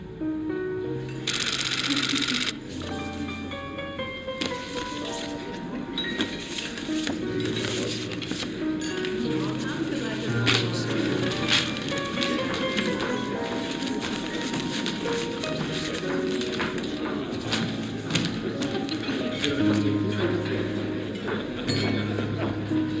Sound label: Piano Blues; Deutschland 86 Ep 10